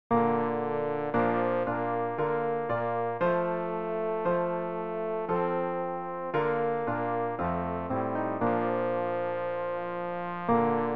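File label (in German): tenor, Übehilfen; Ref. Gesangbuch; RG 098: Du meine Seele, singe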